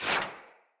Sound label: Dagger Melee Whoosh Layer; Weapons; Player; Swords